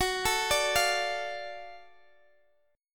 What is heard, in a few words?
F sharp Minor Major 7th Sharp 5th, Guitar, DADGAD; chordshape; down